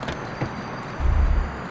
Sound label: stop, vehicles; landstalker